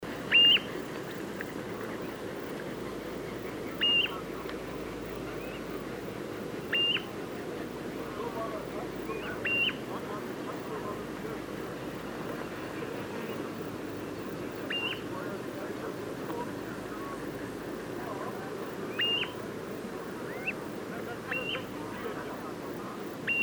crplov; seelhaus see

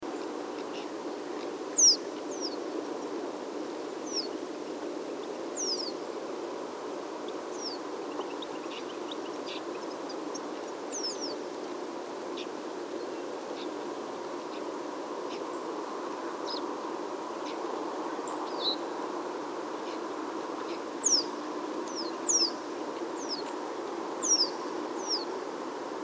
crbun schoeniclus FC sthrush philomelos FC; werb-see

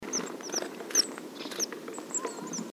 ebtit caeruleus FC

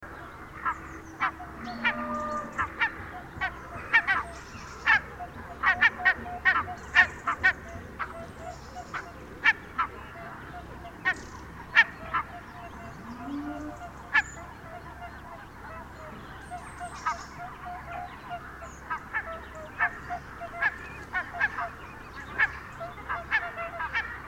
barngoo FC HONK; caerlaverock

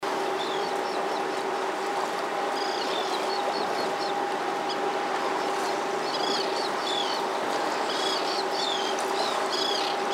STEHIR AD FC CKIP SCO JUV BC CKIT; SonyPCM M10

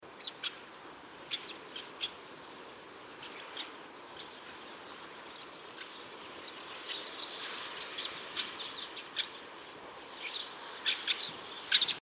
etsparr montanus FL FC; lpz werb see

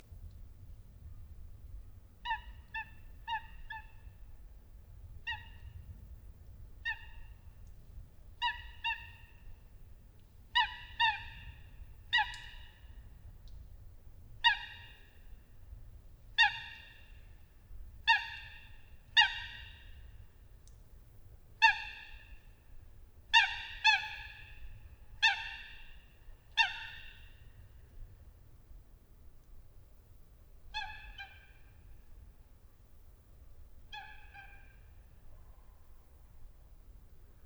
ecoot atra; reudnitz

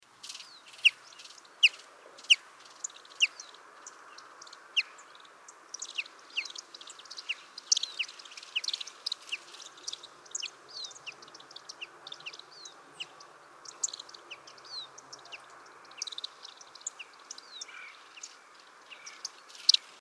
cchaffin coelebs FC yelham citrinella FC; eisfeld

